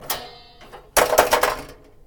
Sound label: move bicycle